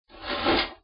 open drawer

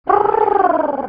monster mumble

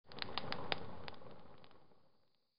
fire fade out

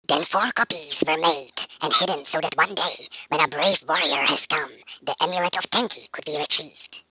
Scroll

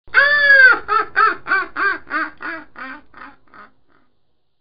witch laugh

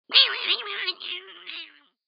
cats

